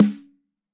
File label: SNARE rim; MX 1335 BRS EFX